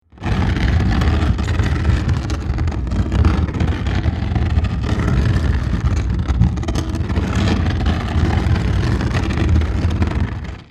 Heavy Wooden Object, scrape wood

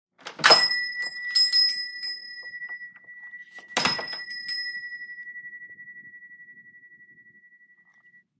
Shop Door Bell OC

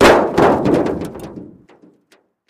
Car Hood Drop On Groun

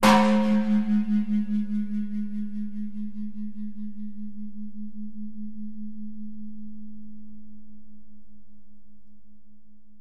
Khmer Temple Bell, bell towers

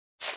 Air Release Quick

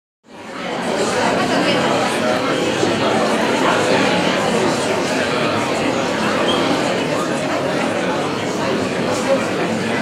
Busy Crowd Lobby, chatter walla

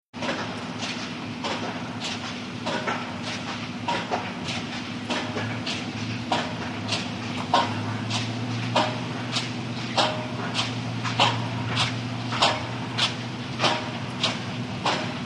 Generator Pile Drive, construction large

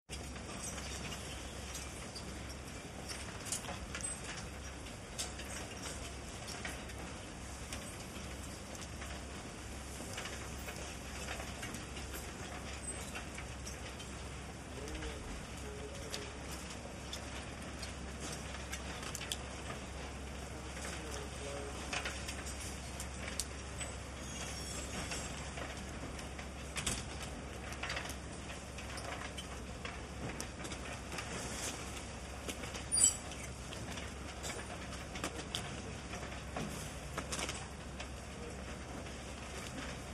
Baggage Belt, airport

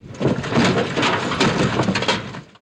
Car Bottom Out; hits & rattles

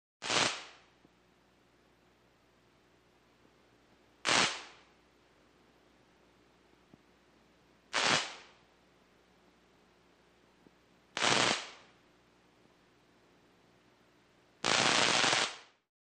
Zaps Medium Pitched

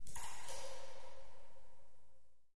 Echoey Cave Drips Wa

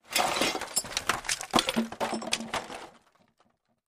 Junk Debris Spill

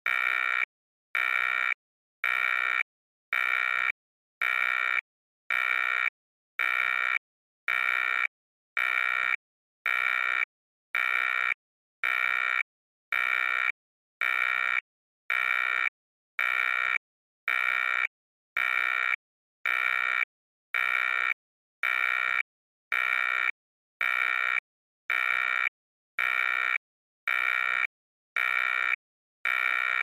Alarm Intruder Aler

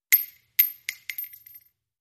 Shell Case Sngle Tube, shell casing movement

